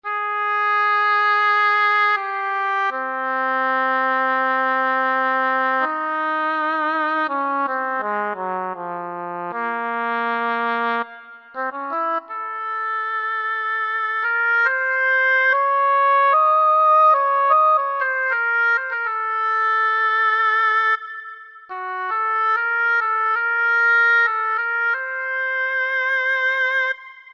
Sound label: Oboe, Yamaha PSR-3000; Factory Voices; Flute & Woodwind